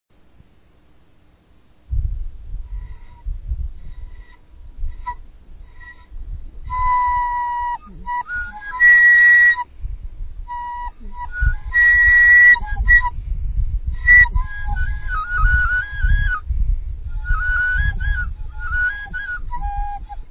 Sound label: Khamu songs